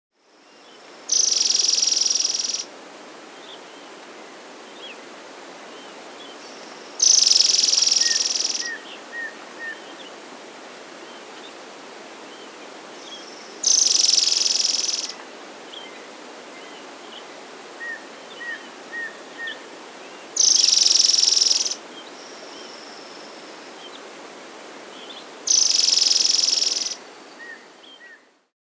White-Sided Flower-Piercer (Diglossa Albilatera), Andean Birds; S. America